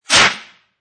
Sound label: Fire Extinguisher Short, Powder based fire extinguisher, a short shot, very hard and rash, c; Powder based fire extinguisher, a short shot, very hard and rash, close to the listener perspective; Spraying; Lynne Music